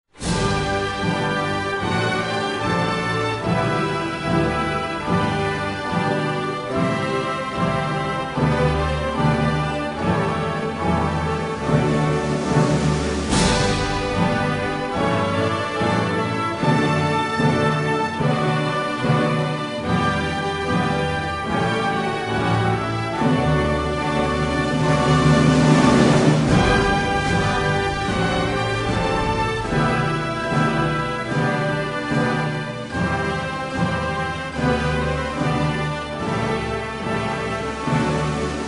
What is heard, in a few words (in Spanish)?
Himno De Inglaterra